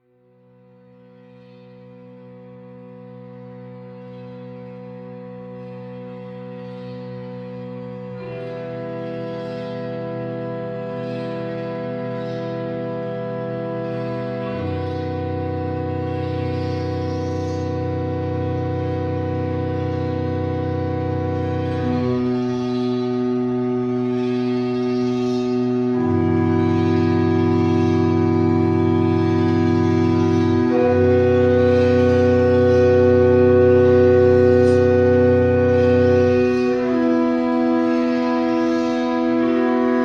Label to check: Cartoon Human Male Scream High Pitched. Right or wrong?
Wrong